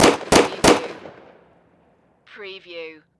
Pistol 45 Springfield

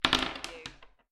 Dice Throw Two Dice On Wood Table

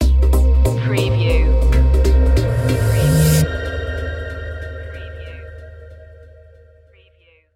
electric dreams stinger

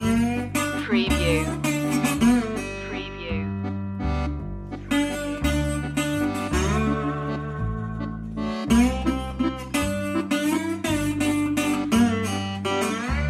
cajun bon ami loop

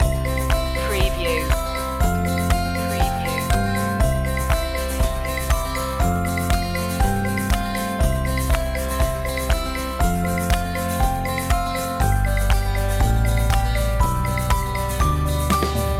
happy camper loop

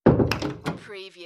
Domestic Door, Wood Door Close

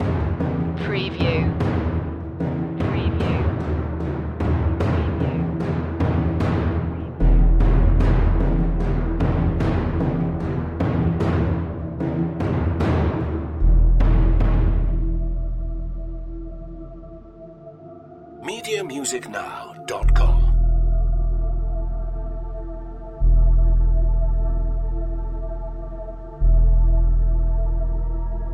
neon nightscape tension no sfx

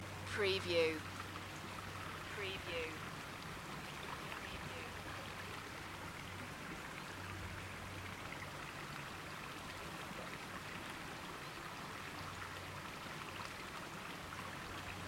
Flood water running down a mountain path; Moel Famau

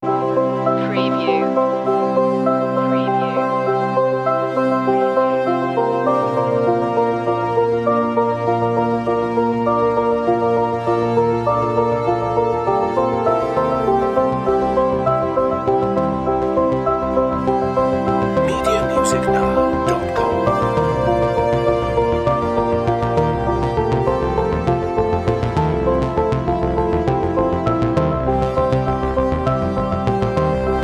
transition

